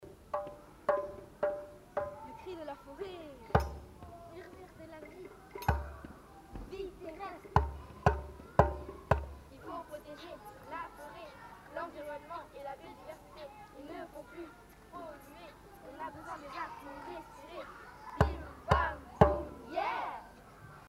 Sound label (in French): Le cri de la foret